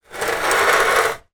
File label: household step ladder drag